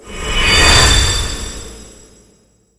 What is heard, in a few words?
draw, weapons; divine titan